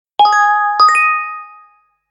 Message

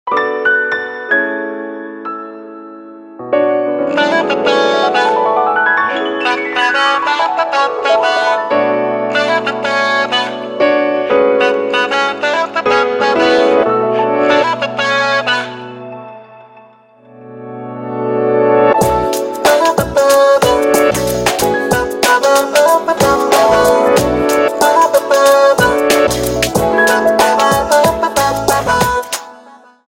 City light; Samsung X01SC Omnia PRO

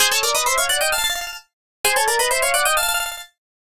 ringtone; Google; Android Open Source Project

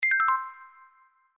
Lock

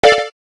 digital, keytone; SKY IM-A600S Sirius

